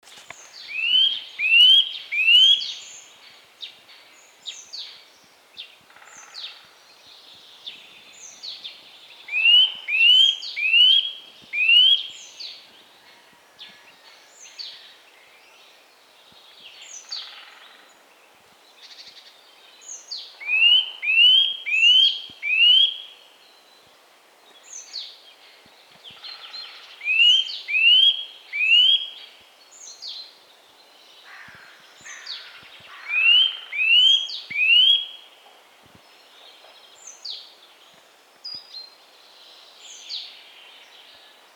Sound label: Eurasian Nuthatch Sitta europaea